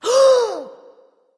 breath; smite; voicepack; neith

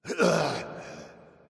grunt; smite; thor

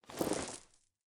idle swish whip; smite; osiris

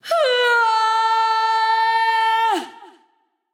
battlecry; smite; pele

